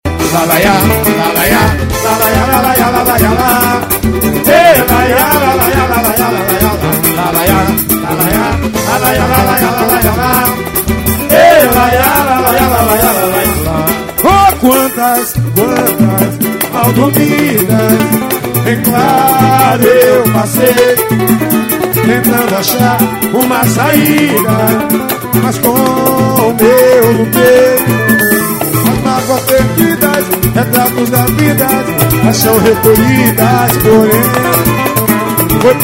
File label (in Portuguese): grupo samba